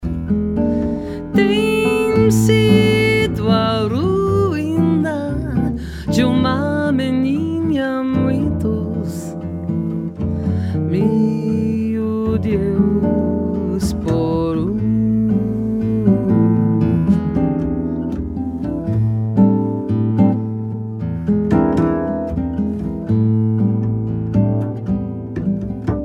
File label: Bossa Crescente